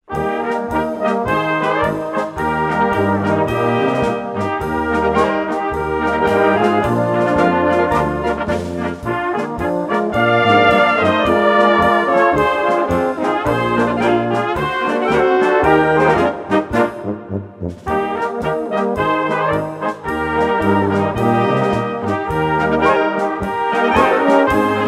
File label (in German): Blasmusik